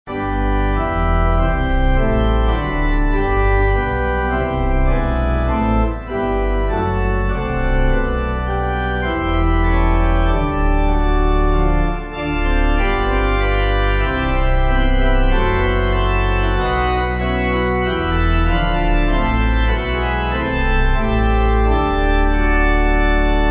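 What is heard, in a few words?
Pipe, Pipe Organ; O Lord Most High; Gonfalon Royal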